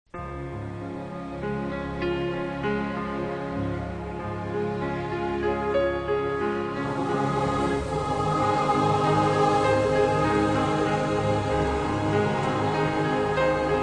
Choir And Orchestra

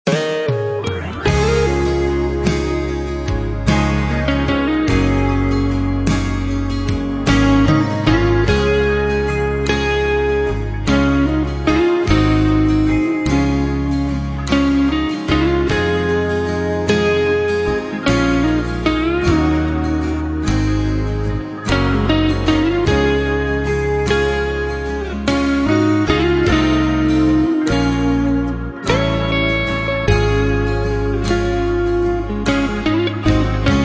Instrumental Hymns

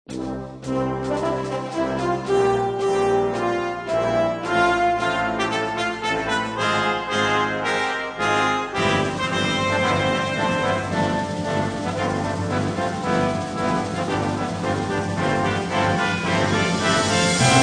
Brass Choir

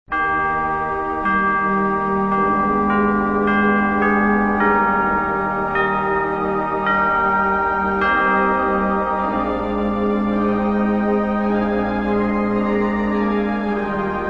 cathedral organ and chimes; Saviour, Like A Shepherd Lead Us

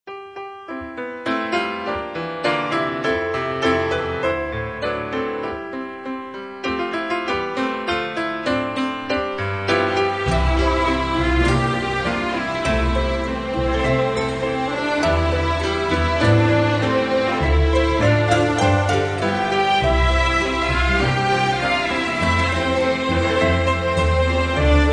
Orchestra, Strings

